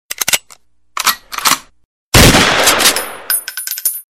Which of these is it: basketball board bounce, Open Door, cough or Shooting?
Shooting